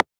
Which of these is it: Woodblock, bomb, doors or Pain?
Woodblock